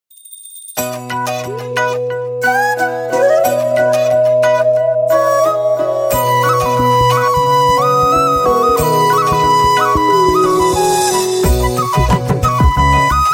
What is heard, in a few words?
pipedream ringtone